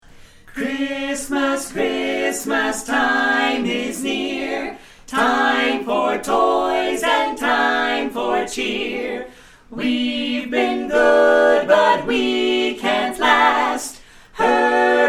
Carolers; christmas

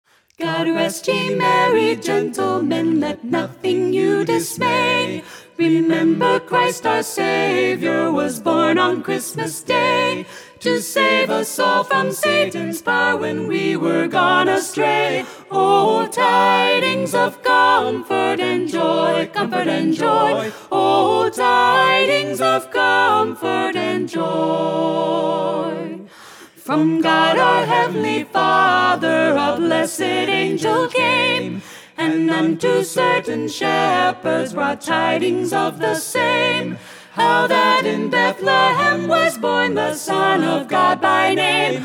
Carolers; Boston; God Rest Ye

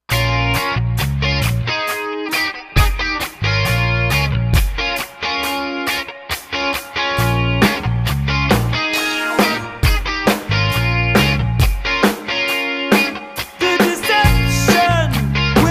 dance band; Sacramento

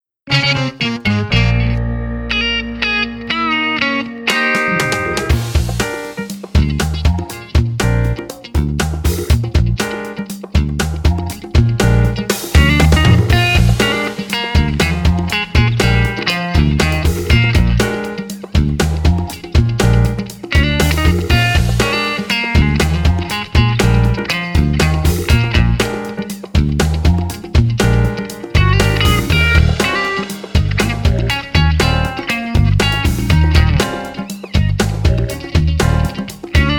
Jazz Guitarist; Atlanta

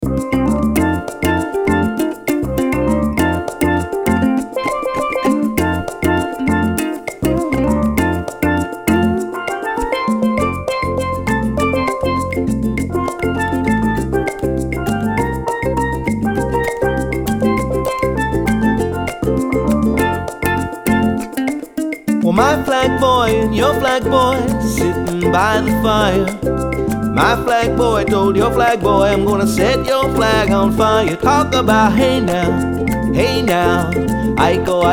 Caribbean Band

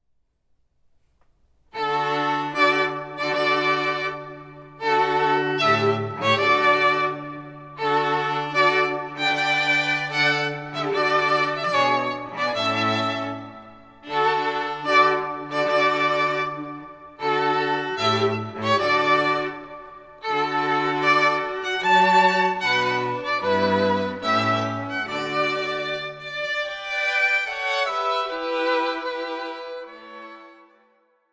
String Quartet; Dallas

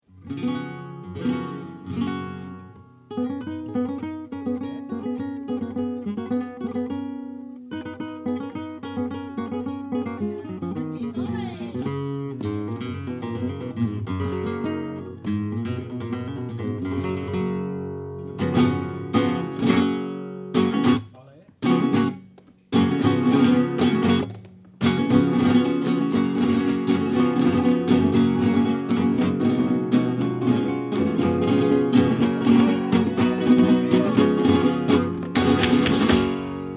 Flamenco Group, Tanguillo; Montreal

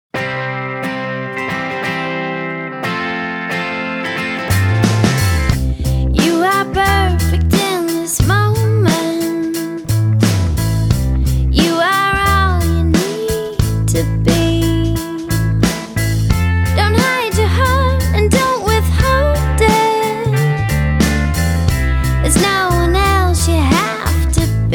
Pop Singer

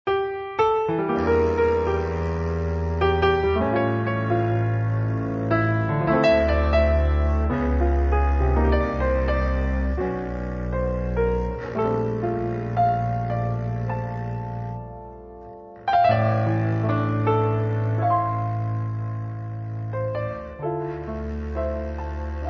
Jazz Band; Seattle

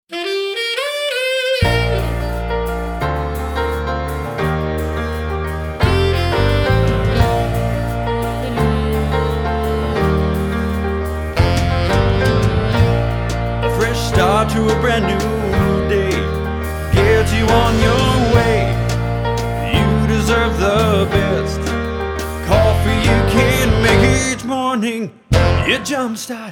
Pianist Jingle; Los Angeles; pop/instrumental crossover

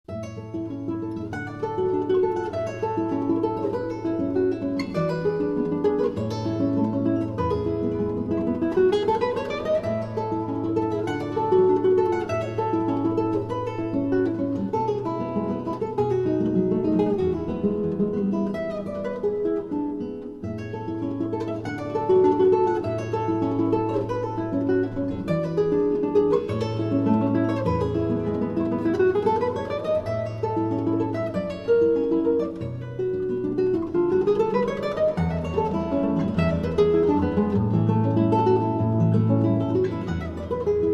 Classical Guitarist, Étude en arpegios; Montreal

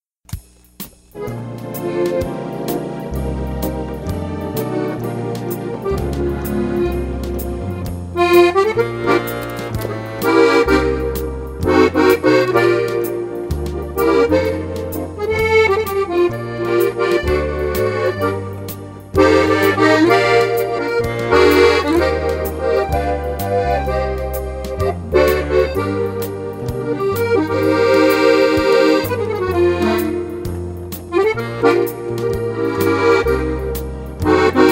French Band; Denver

